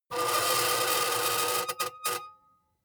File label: metal screech phaser deep